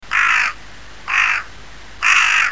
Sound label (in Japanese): hasibosogarasu